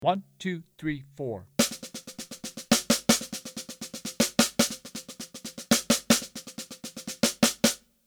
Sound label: Straigth 8ths Adaptive Overlay